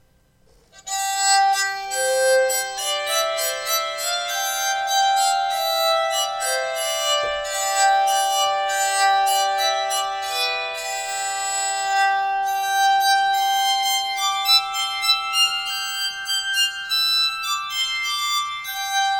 song, bowed psaltery

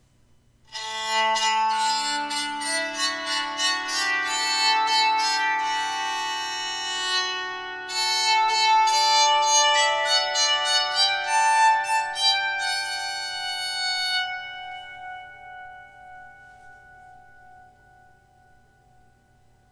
song, bowed psaltery

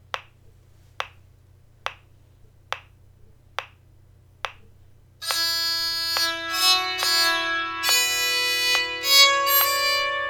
Arran Boat Song, bowed psaltery

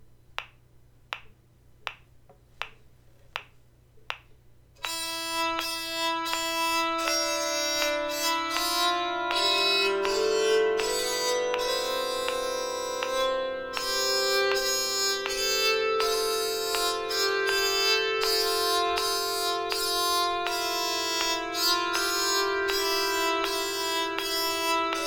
PS, bowed psaltery; Music Alone Shall Live

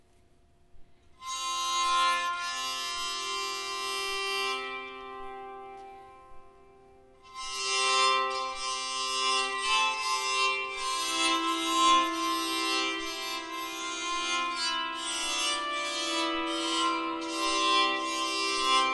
double bow tenor, bowed psaltery

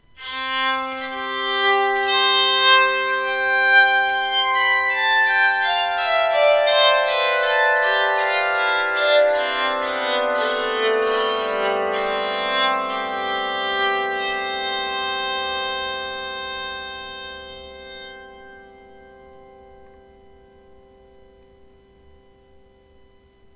scale, bowed psaltery

